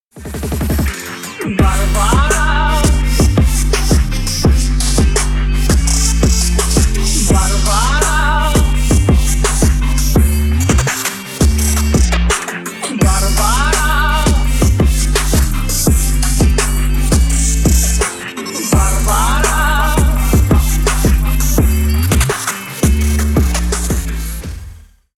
trap remix

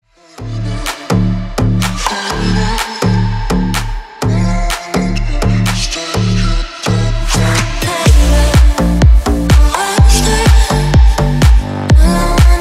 loop echo

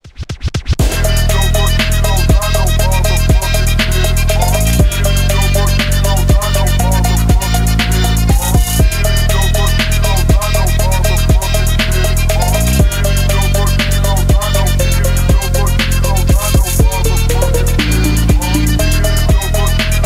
phonk edition

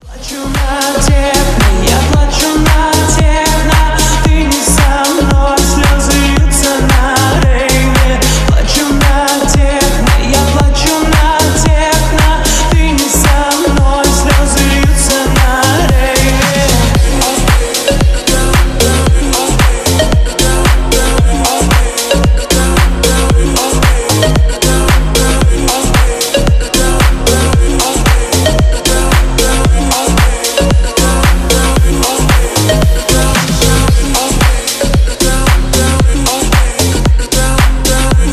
slow bass remix

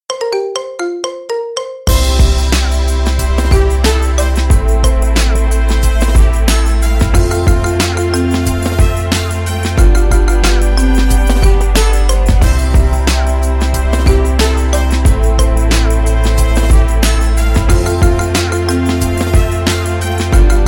marimba remix

